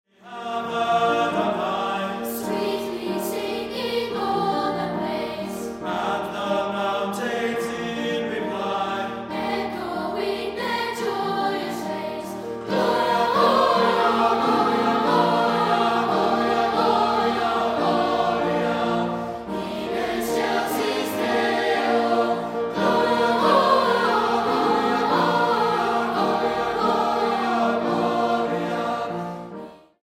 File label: Childrens Choirs; Christmas With Kinder; African Gloria